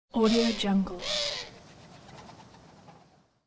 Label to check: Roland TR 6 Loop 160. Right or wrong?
Wrong